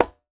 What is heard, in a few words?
quinto, congas; Voice Crystal - Steve Reid Definitive Percussion